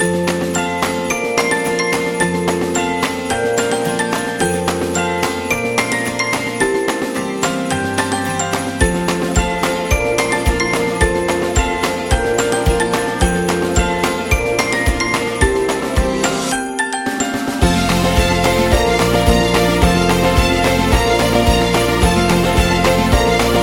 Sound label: Happy Christmas Folk; xmas